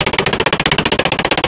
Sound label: Vehicle Engines; Red Orchestra 41-45